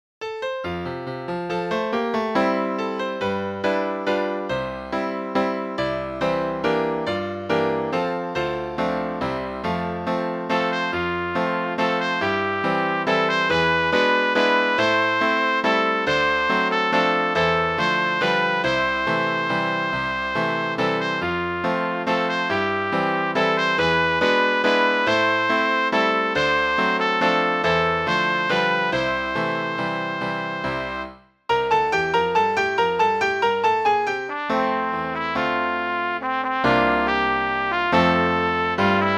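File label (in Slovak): pre trúbku a klavír; Šachová dáma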